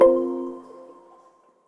button Sound; Whiteboard

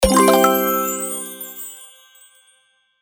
win Sound; Puzzles; Jeep

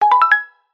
reveal; Hangman

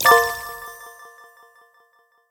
key; GeoTombs

